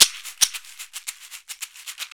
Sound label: Caxixi Beats; Roland LCDP14 Africa VOL-2